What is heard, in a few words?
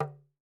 TAM TAM, African Drums